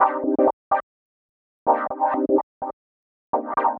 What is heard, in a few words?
126 G C music, Funky Underground; Loopmasters; Funky Underground Vol 2